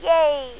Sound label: yay